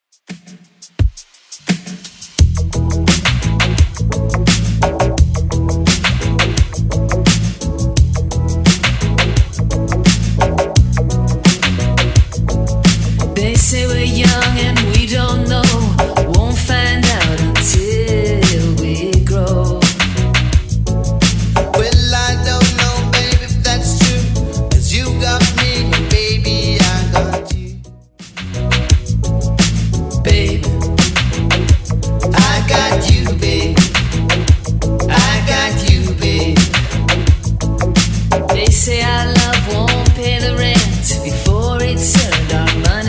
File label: 80s Redrum, 86 bpm